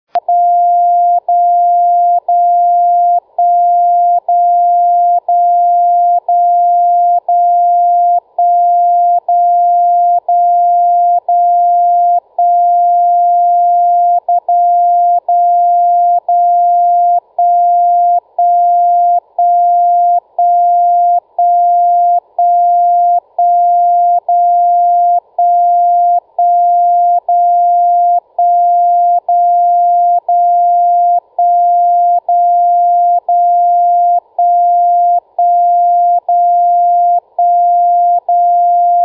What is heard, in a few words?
75kHz; broadcast